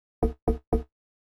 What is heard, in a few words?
Drum; hydrogen